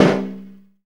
SNR LO FLAM; Zero-G; Funky Elements

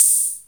CR 78 KIT

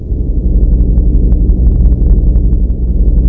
IN UFO, SPATIAL; East West - Global Sounds

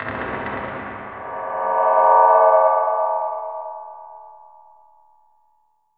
PERC FX; Zero G; Malice In Wonderland

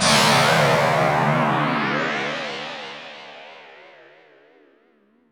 Flanged, Synth FX; musicradar; cartoon caper

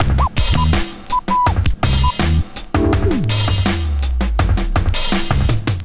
Drum Loop, Drum Loops

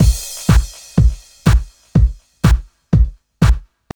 house loop

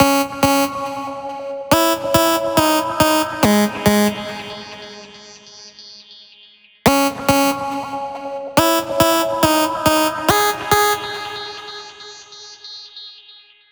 140BPM C#; Vengeance - Essential Clubsounds Vol.4

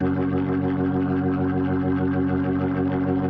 B3, First Call Vintage Keyboards

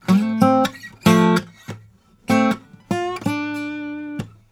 Blues Guitar Samples

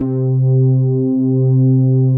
Dance Floor Bass, Multisample Basses

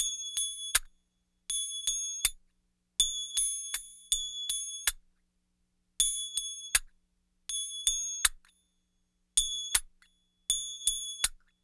Finger Bell Rhythm, East Indian; Sonic Foundry (Sony Creative Software); Continental Drift Aftershocks

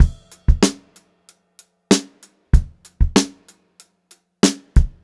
Funk-Groove Drumloops; USB Soundscan vol.38; AKAI

